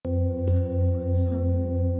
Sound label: singing bowl